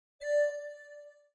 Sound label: w2k - notify (D)